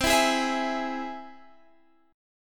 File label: C Augmented, Guitar Standard, chord; strummed; capo 3; 20,19,18,21,21,x